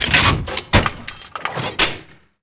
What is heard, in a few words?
ac130 40mm reload